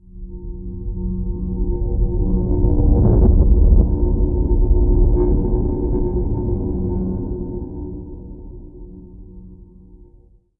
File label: amb random abstract; ambient; Portal 2